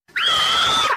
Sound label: girl scream